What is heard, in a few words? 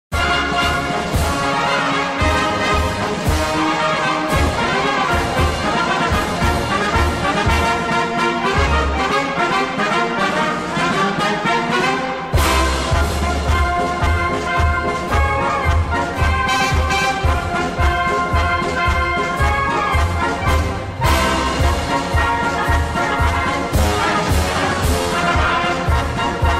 uzbek march; o zbekiston marshi; march uzbekistan